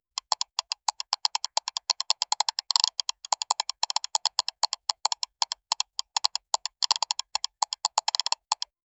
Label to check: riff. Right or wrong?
Wrong